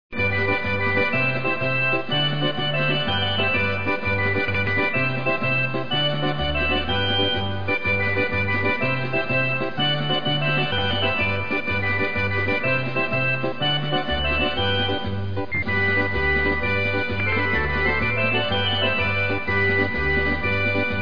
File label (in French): farandole